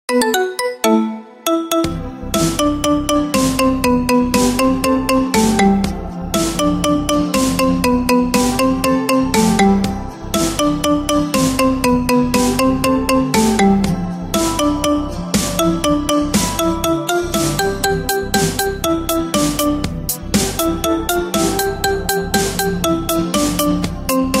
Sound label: marimba remix ringtone